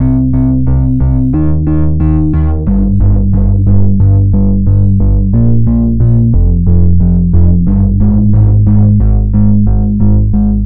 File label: bass